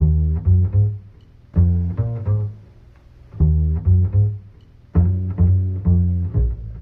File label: bass lovers, Loops, Jazzmatazz